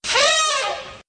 trumpet

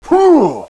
attack; caleb

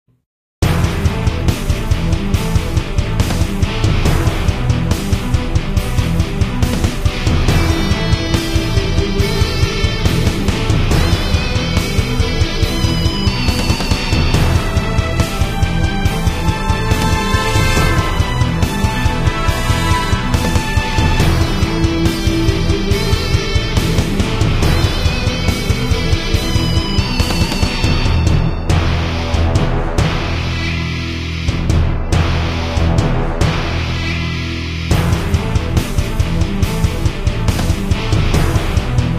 loading, music; dn3d r; dnf

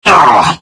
pain, paralyzed subject

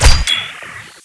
ice bolt fire, weapons

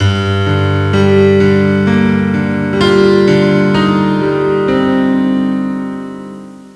dark piano

